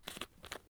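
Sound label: tetrapak with cap open; Escape from Tarkov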